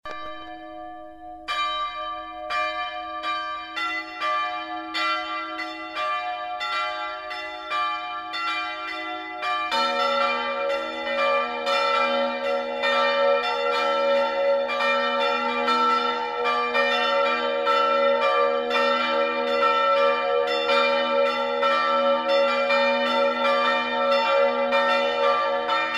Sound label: Campanas (bells); schola